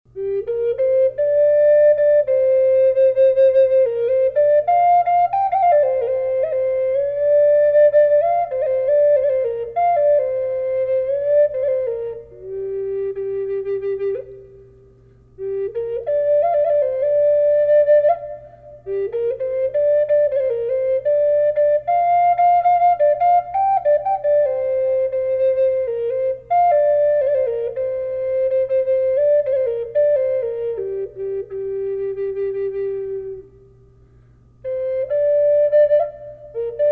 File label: bobcat reverb g flute